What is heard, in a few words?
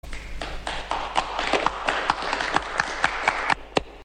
cheering applause